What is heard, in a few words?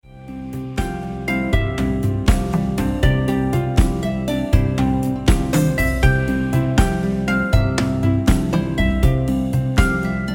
Yoga Mix